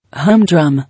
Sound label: Humdrum